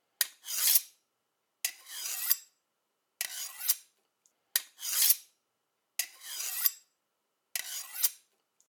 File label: Small Blade Weapon Fix; Scrap Weapons; Zomboid